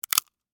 Crack Crunch Single, Texture, Crack, Crunch, Single, Penne, Rigate; Food; Blast Wave